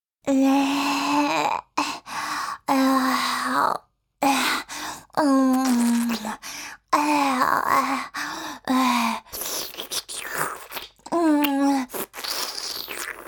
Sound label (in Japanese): フェラチオ(中):包皮と亀頭の隙間に舌をこじ入れて舐める, チュパ音, ASMR